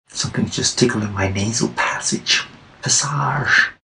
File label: nasal